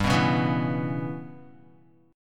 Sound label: G 6th Flat 5th, Guitar; G6b5 strummed; JGuitar